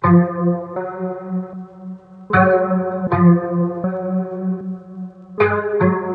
Dark Melody Loop - 156 BPM D# Min Guitar; Loop Stems; Cymatics; 2020 Melody Collection

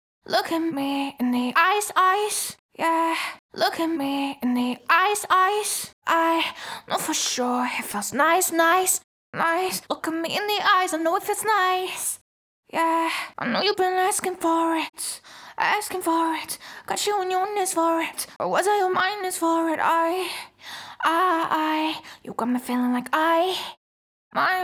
CLEAN LEAD (EMaj) BPM145, Pop Vocals Acapellas; Eyes; Ghosthack; Ultimate Pop Vocals

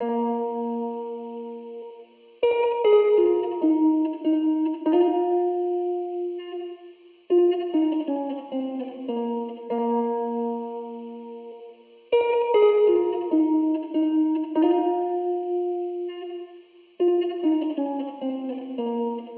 Nostalgic Melody Loop - 99 BPM F# Maj Top Line; Melodies; Loop Stems; Cymatics; Oracle Sample Pack

